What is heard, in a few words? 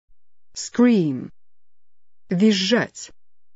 scream